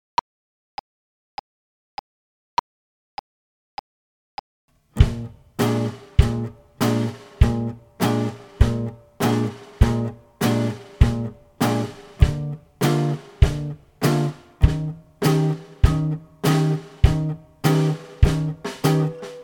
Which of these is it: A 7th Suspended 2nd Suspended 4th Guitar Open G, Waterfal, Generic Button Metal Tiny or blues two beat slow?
blues two beat slow